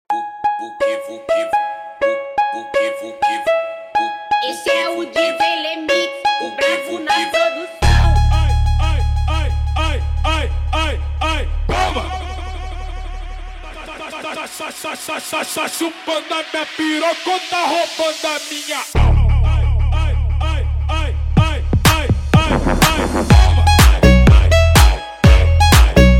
mega funk

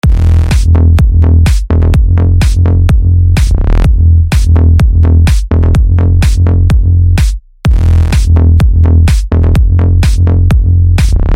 base mega funk psy trance; pack bases mega funk 2020

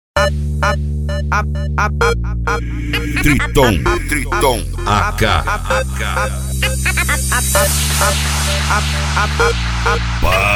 base instrumental eletrofunk; cd paredinha discarada vol 02

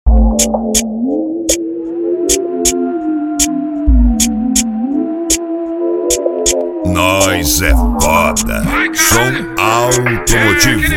eletrofunk; som automotivo

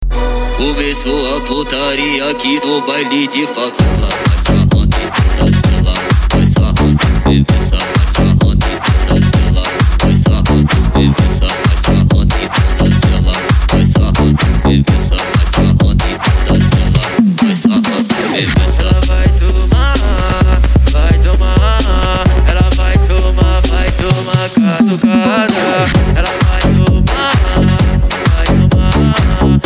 mega funk e funk mega bass

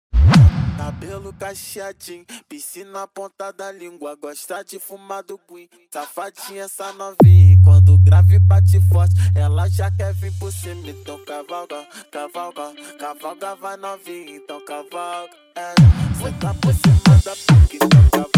esp pancada

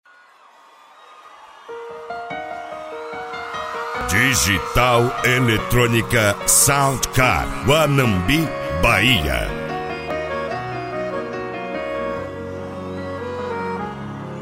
pancanejo eletronica sound car

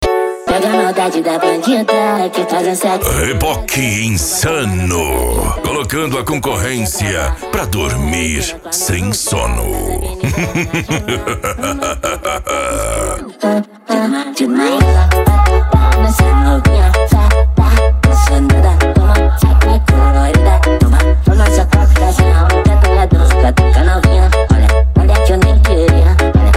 noia dance e funk mega bass

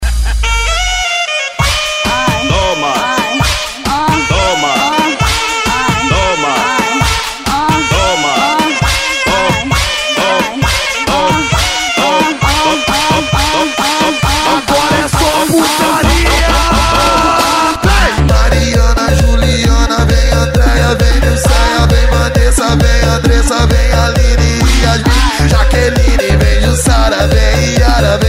eletro funk; cd balada eletro funk vol4

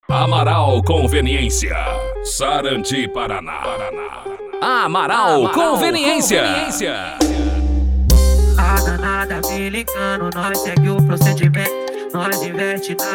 funk e sertanejo; amaral conveniencia; sarandi pr

